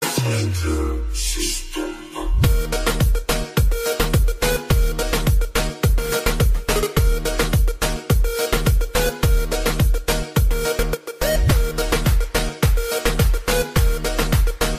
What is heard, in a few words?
electro